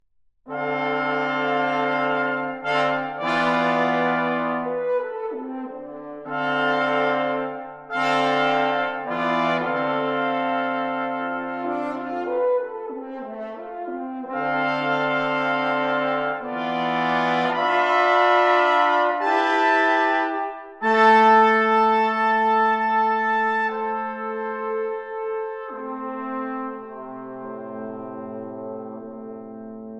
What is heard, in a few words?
Brass Instruments - Fugue